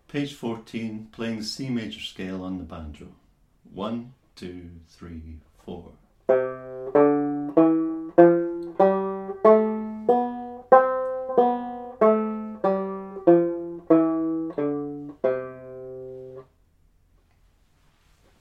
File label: c major scale; Bradbury Studies